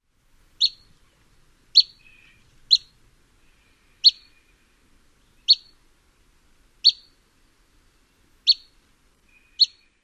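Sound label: PYGNUT calls num 1, pygmy nuthatch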